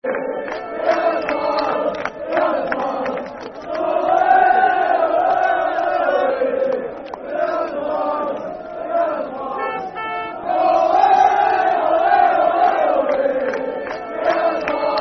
Ole, Ole, Ole, Football Chant (Soccer Song); Dunfermline Dafc

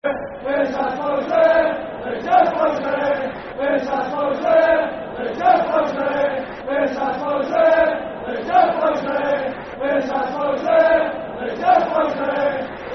Football Chant (Soccer Song), Brighton; Asbo's; Brighton Bhafc

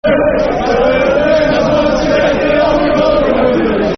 Wembley [Football Chant (Soccer Song)]; Swansea City Scfc

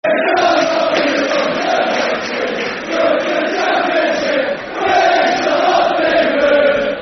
We Shall Not Be Moved, Football Chant; We Shall Not Be Moved-Trfc; Tranmere Rovers Trfc

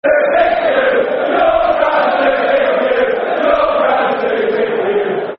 Football Chants; Your Ground's Too Big For You; Derby County Dcfc

